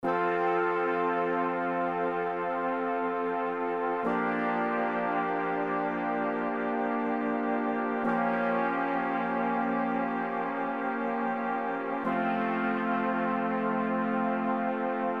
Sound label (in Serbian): akordi limeni duvaci dur